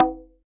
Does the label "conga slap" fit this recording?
Yes